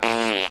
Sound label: reverb fart